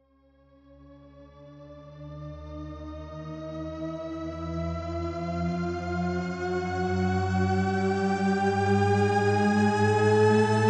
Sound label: Shepard; iZotope Iris